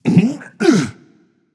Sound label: grunt lift; smite; agni